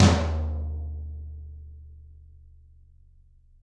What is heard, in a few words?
Toms, Drums; Bob Clearmountain Drums I